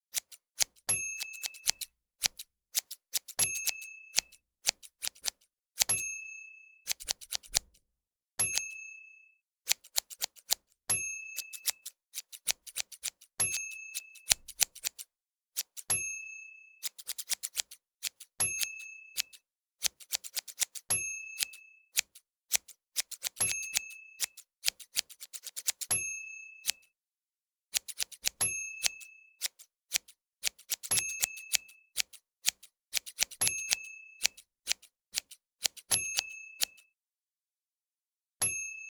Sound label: Cutting Hair With Scissors